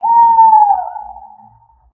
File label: owl high, ambient outdoor